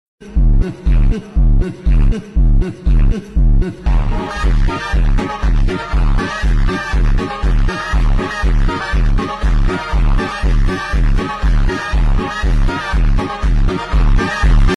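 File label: serbian lady song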